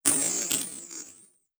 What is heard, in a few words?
flying tone, Cartoon Film Effects